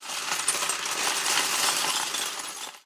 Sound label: Lego box full of Legos moving and shaking